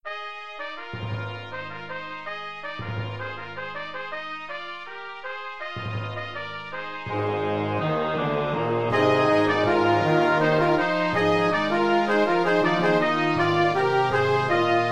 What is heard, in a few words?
Fanfare